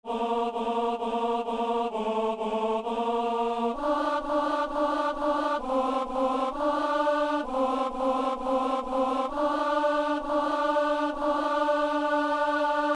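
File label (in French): ténor; messe de san lorenzo; kyrie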